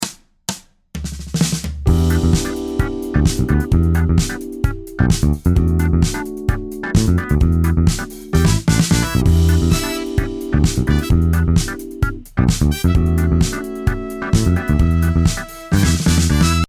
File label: 130 Em9 A7 on E